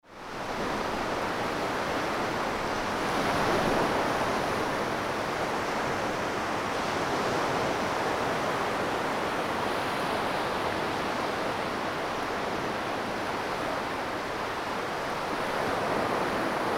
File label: wave